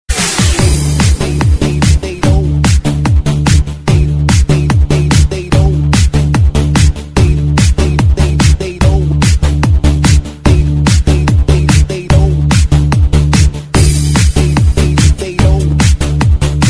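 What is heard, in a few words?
guitar dance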